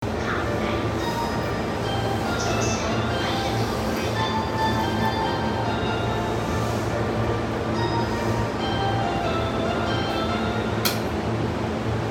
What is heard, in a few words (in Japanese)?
京浜東北線関内南, train sounds